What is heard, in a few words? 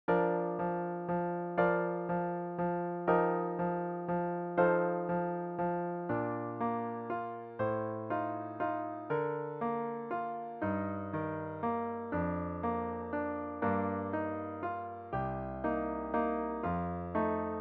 piano only